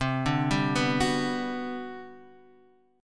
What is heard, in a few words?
G Major 7th, C; arpeggiated; JGuitar